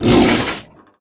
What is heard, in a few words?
mech ape lord damage, monster